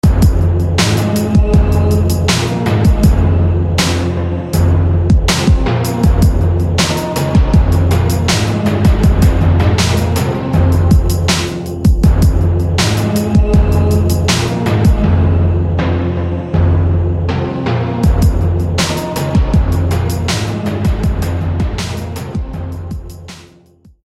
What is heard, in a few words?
Soul Drum; SFB FX